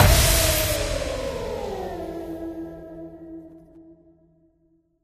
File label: Death Stinger; runic rampage